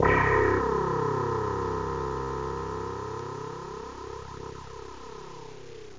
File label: mirage clav; OctaMED SoundStudio V1